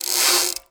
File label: Menu Mechanical; Menu FX